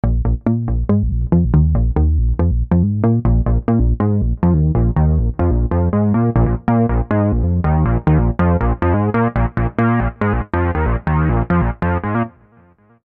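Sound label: Double Kick Bass; V-Synth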